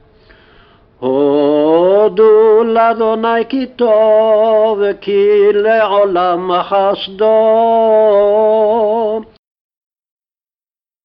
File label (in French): refrain chanté